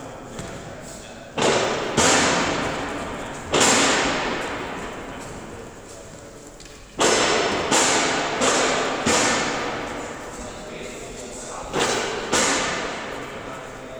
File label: foley